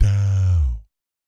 DOW G2, Vocal Planet Jazz & FX; ILIO; JAZZCOMBO

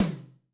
AC Tom M, Yamaha DTXpress-II

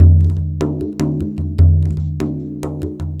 Frame Drum, Ethnic Percussion; Sonic Foundry (Sony Creative Software); Ambient Atmospheres & Rhythms

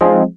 wurlitzer, dx7

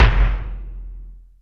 BOOMP; E-MU Formula 4000 Series Vol. 2

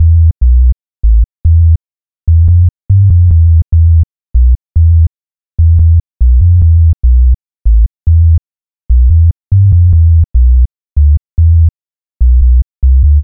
Low Bass; Sonic Foundry (Sony Creative Software); Club Joints and Street Anthems

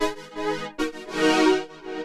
SYNTH; USB Soundscan vol.32; Roots Disco; AKAI

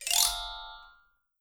VIBE LICKS; Sampleheads - Dave Samuels Marimba & Vibes

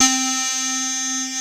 TINY LEAD C3 L, KORG, Analog; USB Soundscan vol.49; Analog Fantasies; AKAI

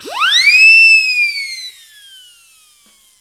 INST FX, Ragga & Dub Reggae

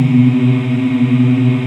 African Mm m; Roland LCDP11 Africa VOL-1

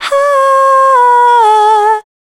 VOC F, Dance Vocals, FEMALE

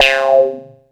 BING, CARTOON

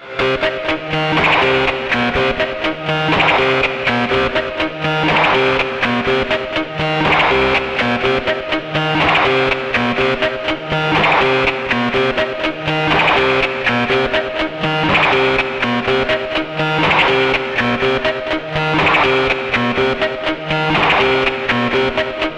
C2, Synth Line; 90 s Sample CDs; NiceBeats; SynthLine VOL-13

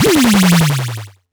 LFO, Noise

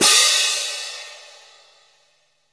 PIATTI, CYMBALS

